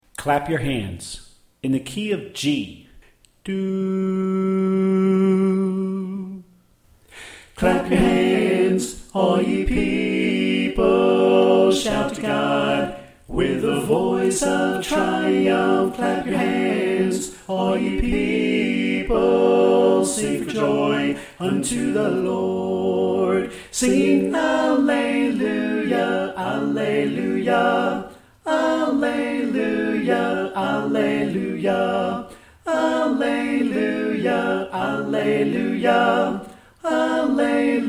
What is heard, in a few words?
Tenor Left